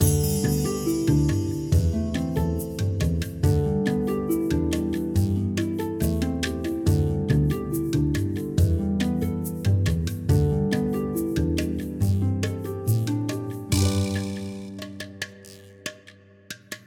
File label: library Music MUSIC; Castle on the Coast; PS4